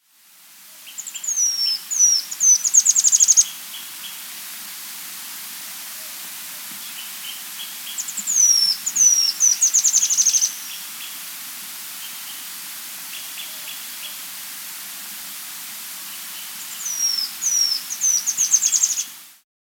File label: Spot-Crowned Woodcreeper (Lepidocolaptes Affinus), Andean Birds; S. America